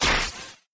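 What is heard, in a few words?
chain lightning sound; Arc of Templar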